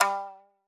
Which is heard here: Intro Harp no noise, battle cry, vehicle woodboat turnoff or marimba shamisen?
marimba shamisen